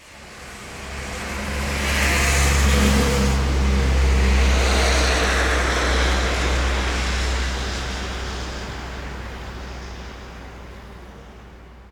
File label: truck on highway, street traffic; best service Blue Box